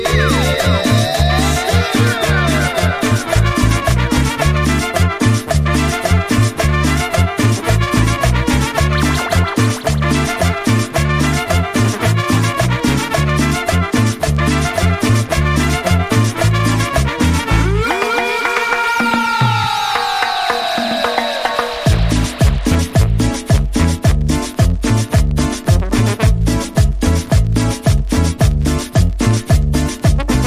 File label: INSTRUMENTAL